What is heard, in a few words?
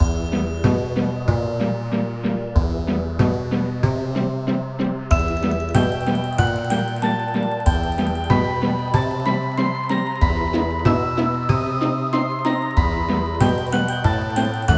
Cinematic Scheming; Jankbrain